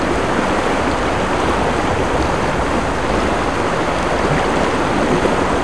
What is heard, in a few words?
rural river loop; ambient; Left 4 Dead 2